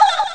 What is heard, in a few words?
laugh; Amiga Soundtracker Sample Packs